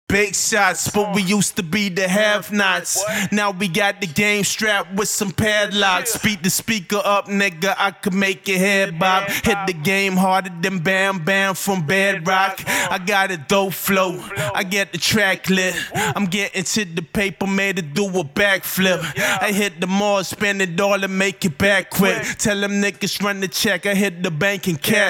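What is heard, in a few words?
hip hop, 148, A flat minor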